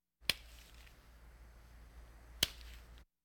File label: Hospital effects